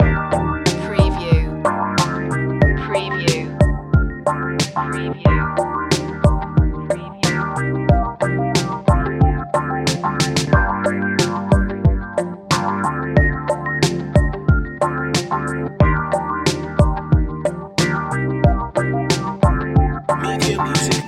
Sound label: mellow retro loop